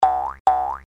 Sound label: Boing Boing; suoniFX